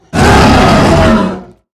Monster Hurt Big; Monster George Hurt Big

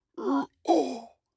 Zombie Attack

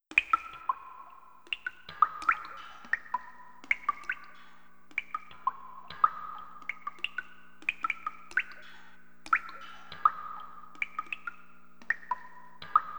Cave Ambience; N64; gamerip; Pokémon Snap

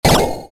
monster turret

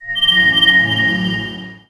Heal Cast

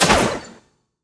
SFX Battle Basic Damage; SFXBattleBasicDamage4

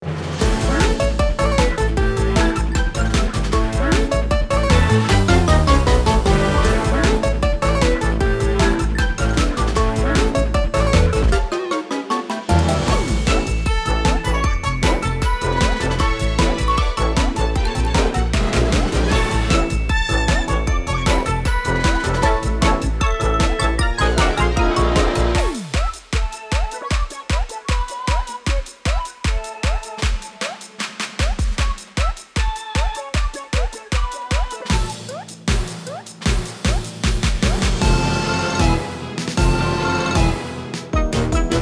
BGM BATTLE MENU; 100% Pascal Sensei Kanpeki Paint Bombers; 3DS

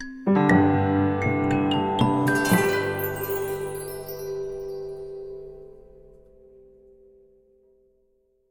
start expedition jingle; curious expedition 2 macos ps4 switch windows xbox one xbox series xs gamerip 2021

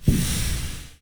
Laser Prepare; gamerip; Radiance

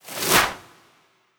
Exile Throw

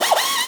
Air Ratchet; Monster Jam Steel Titans

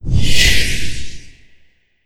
Elementaliste Skill Spell

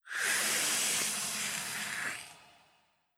Scarab Scream

